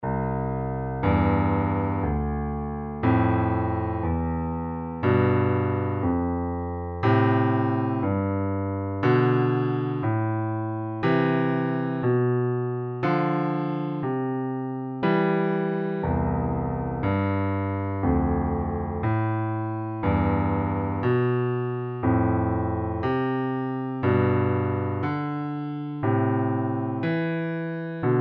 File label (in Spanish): acordes quebrados